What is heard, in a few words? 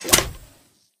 Music Bear Trap Snap; doodle jump 2; android